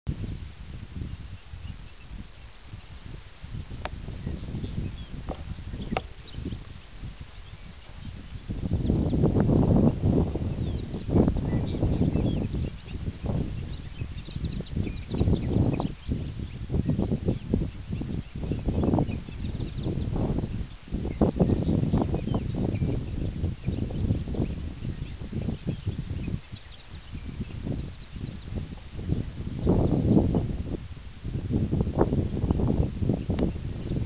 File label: perpallidus, ammodramus savannarum